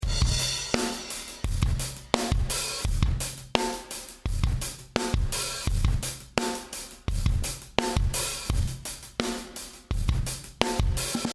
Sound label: Drumbeat American Shape